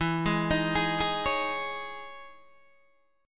E Major 13th down Guitar Open D

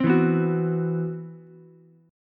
C Major Sharp 11th, Ukulele C6; CM#11 strummed; JGuitar

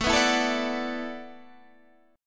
E 9th Suspended 4th A, guitar

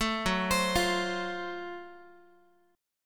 Gb Minor Major 7th Flat 5th Gb down Guitar; arpeggiated; 12,14,15,15,x,x; JGuitar

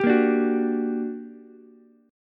G Minor Major 7th G, Ukulele; strummed; JGuitar

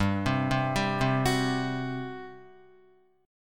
G Major 7th Suspended 4th, G, down, Guitar; GM7sus4 arpeggiated; JGuitar

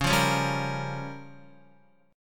Db 6th Flat 5th, Guitar; strummed; JGuitar

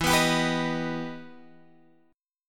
E Minor Major 7th, Guitar, Drop D; down

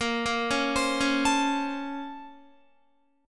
Bb Minor Major 9th, Guitar; BbmM9 arpeggiated; JGuitar

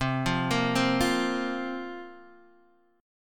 C 7th Suspended 4th, Guitar, Half Step Down; C7sus4

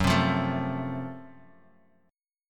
F sharp Minor Major 7th Flat 5th, guitar; strummed

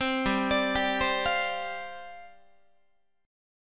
G 7th Suspended 4th G down Guitar

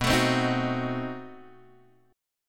B 7th Flat 9th, down, Guitar, Open G

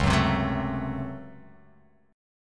Db Major 7th Suspended 4th Sharp 5th Db down; DbM7sus4#5 strummed; JGuitar

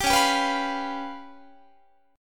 Db 7th Flat 5th Db, down, Guitar; Guitar-4A,4E,5A,5D,5Gsharp,6Csharp; Db7b5 strummed

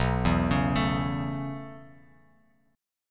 B 9th Suspended 4th B down Bass Standard; B9sus4; arpeggiated; JGuitar

